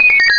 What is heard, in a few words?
POTION; Crystal Bubble